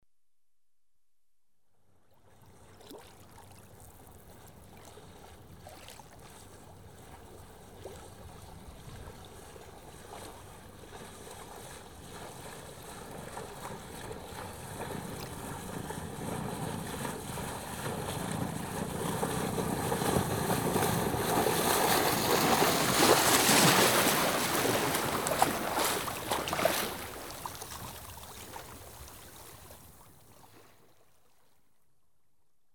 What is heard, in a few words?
sail past left to right, Ships & Boats; The BBC Sound Effects Library